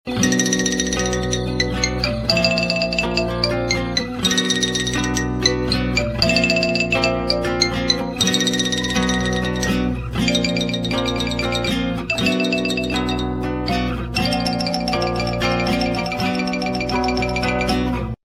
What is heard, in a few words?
locrien 7M6-